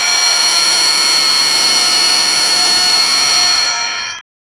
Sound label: dungeon trap spinning saw